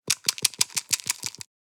Pouring pills into hand plastic pill container; medicine

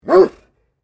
Large dog barking single bark

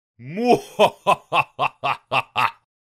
Middle aged man laugh sinister

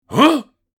Elderly man surprise gasp; misc human

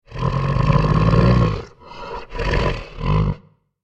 Bear growling and snarling; animal

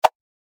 Quick swipe transition; shorts sound effects

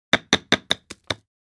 Fast chopping knife cutting board

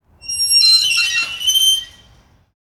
Horror metal gate squeaking

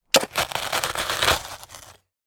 Digging shovel into dirt single

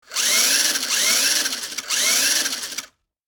Remote controlled sport car motor revving; rc toys

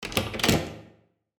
Household bedroom door open

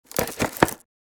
Wicker basket lid lift and open

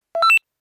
Walkie talkie turn on push button beep

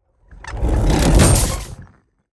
Transitional vintage impact with air release

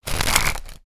Deck of playing cards riffle shuffle

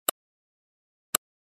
Computer mouse long click

